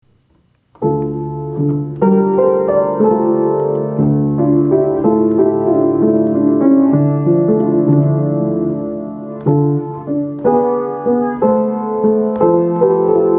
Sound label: verset per organo; venti versetti